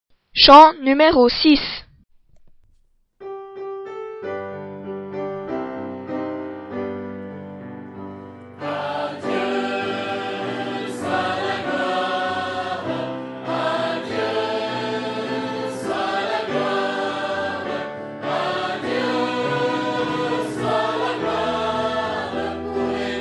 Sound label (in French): Chant; FR